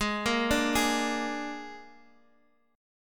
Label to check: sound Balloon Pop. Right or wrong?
Wrong